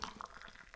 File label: Kettle Pour